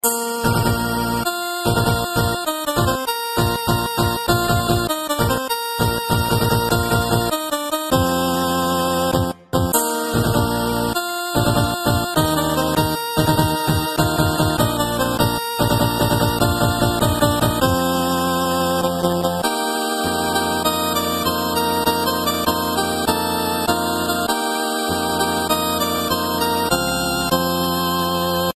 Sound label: star wars 8 bit